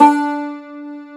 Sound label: Cumbus Saz D3; Planet Earth; Digital Sound Factory Planet Earth